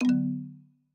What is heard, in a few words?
Sfx Ui Goldenbar Music End Negative; Vinebound: Tangled Together; PS4, PS5, Switch, Windows, Xbox One